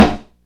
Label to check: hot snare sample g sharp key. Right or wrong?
Right